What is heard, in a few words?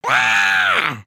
Air Fall; Lego; Strombie; creatures organic